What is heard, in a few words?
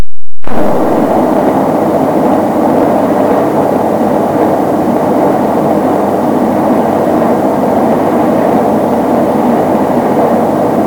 recording room with 2 powerline intercom with 1 on aircon; room le chateau